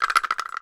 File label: kpoko, Kenya Kpoko Kpoko